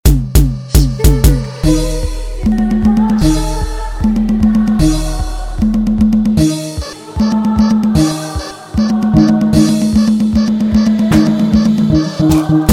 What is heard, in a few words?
Spirit Beat